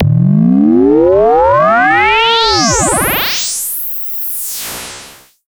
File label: synth sfx; Chillout (ambient1&2)